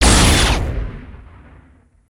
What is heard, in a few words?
Energy Ball Impact; weapons; aronwk collection; ndaudio